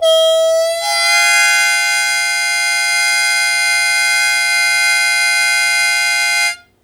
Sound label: Blues Chord Attk Bb1, Harmonica; Blues Chord Attack; Zero G - Harmonica